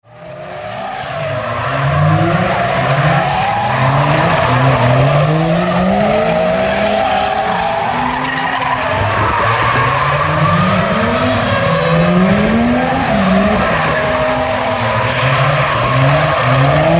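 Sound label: sounds of car brakes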